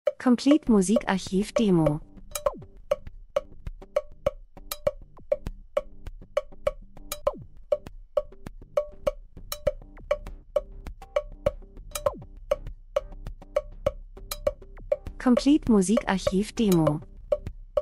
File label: drums; Elektro Percussion Afrika Traditionelle Trommeln Sounddesign Land und Leute Buschmann Reisefilm Natur Dokumentation